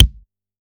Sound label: normal hit normal; Osu!; HentaiKontrol